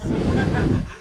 breathing down the mic; Crowdsourced Sample Pack; Crowdsourced Live Stems

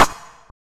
hat snare; Crowdsourced Sample Pack; Drum Kit; Claps & Snares

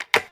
clicky perc; Crowdsourced Sample Pack; TRUTH-HZ; Drum Kit; Percussion

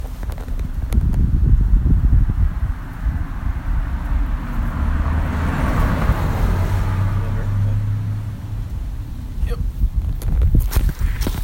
Car Whipping; Foley; Crowdsourced Sample Pack; TRUTH-HZ

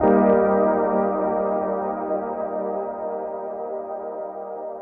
Synth vybe, One-Shot Synths; Crowdsourced Sample Pack; TRUTH-HZ

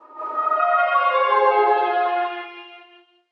juno theme; Melody Loops; TRUTH-HZ; Crowdsourced Sample Pack

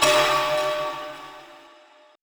plung; Truth-Hz - 1-Hit KO Sample Pack

